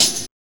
TMB

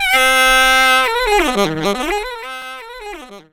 SAX RIF; E-Lab; X Static Goldmine 2; akai; SAX RIFF1

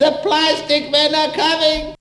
VOX

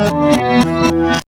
REVERS

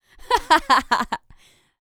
LAUGHS, Vocal Planet Jazz Fx

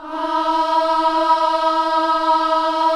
KIDS CHOIR, Gospel

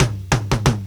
DRMROLL; E-Lab; X Static Goldmine 2; akai

